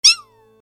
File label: mew